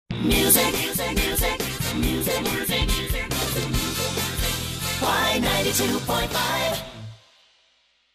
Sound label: jingle music